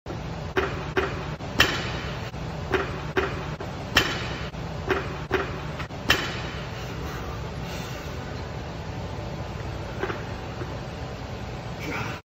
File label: Weightlifting; وزنه برداری